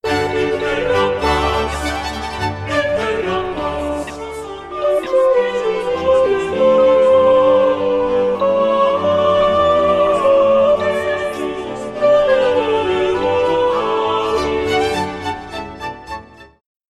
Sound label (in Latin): Gloria sop; Stage Zelenka 2023; zelenka zwv21